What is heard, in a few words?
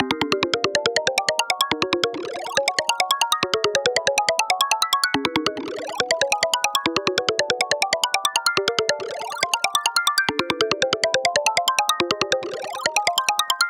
Balls Bells